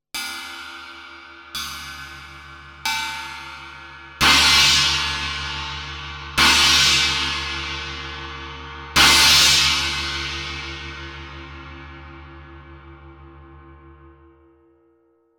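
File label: China Byzance Traditional 16, cymbales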